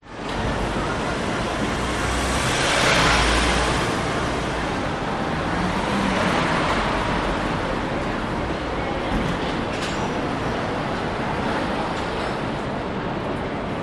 public places city street side road cars pass close people chat bg van horn sydney; cbd